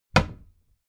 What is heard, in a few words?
cabinet door close heavy